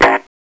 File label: blast beep, Lazer Tag